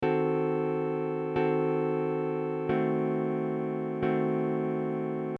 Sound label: Basic Blues Subs Example, guitar